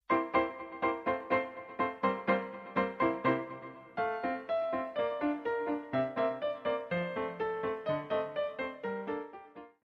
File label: step